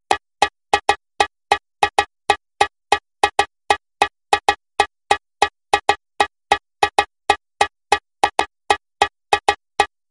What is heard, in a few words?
tamborim